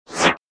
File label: kaze kiri